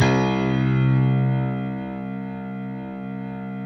six pianos, Prepared Six; Optical Media International; Sonic Images Library

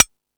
Beat Box, E-MU Pro-Cussion

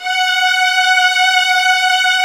F#4, Arco Violas

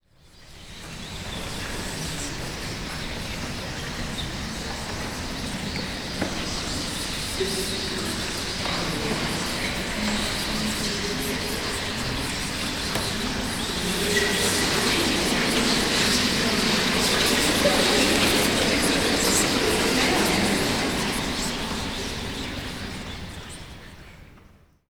CHATTER

